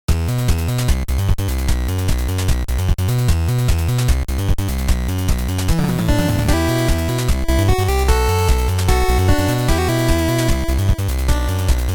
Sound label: Jungle Beat; 1001 Spikes; World 2